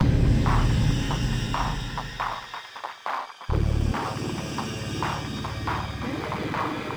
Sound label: Low Groove Intro; Unreal II The Awakening; Acheron